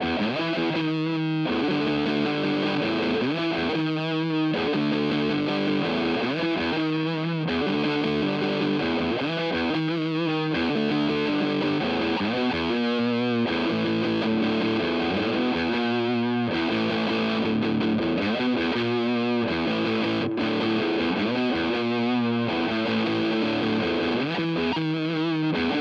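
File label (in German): Hagstrom Zerre Bridge, guitar; Pat Smear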